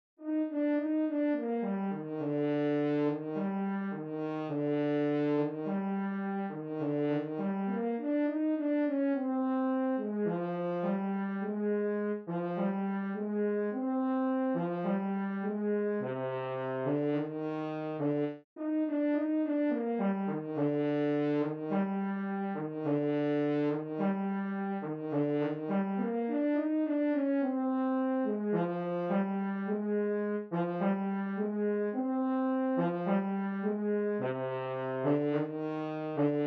French Horn; Sony; ACID Pro 7.0

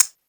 Cabasa 60s Vinyl; Shaker; Drums

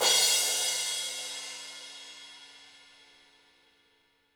Crash Heavy Rock, Cymbal; One Shots; Drums; Ableton Live 12 Lite; Core Library

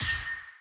effective hit layer

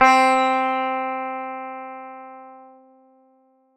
Sitar C3; Guitar & Plucked

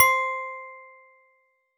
Music Box C4; Mallets

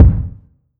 Kick; Ableton Live 12 Lite Core Library

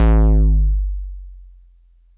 Kick Synth Tonal; One Shots; Drums; Mkit

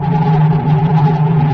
hoverbike brake; There; ThereClient

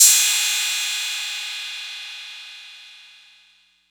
Cymbal 808 Hard; One Shots; Drums; Ableton Live 12 Lite; Core Library

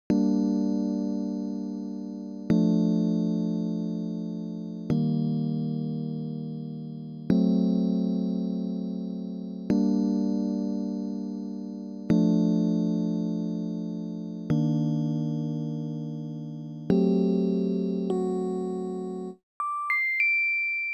Keys; ACID Pro 7.0

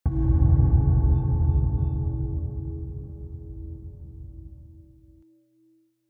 Menu Spotlight; Journey

